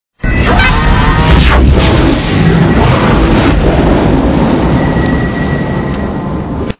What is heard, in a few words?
Gate Open